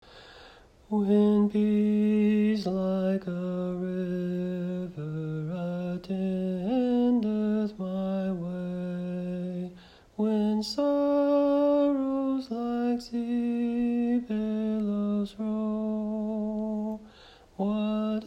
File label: Soprano; It Is Well With My Soul